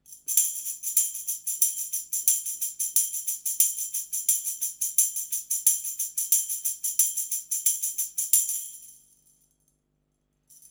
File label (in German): schellenkranz; mkl-5000